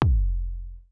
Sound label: Bump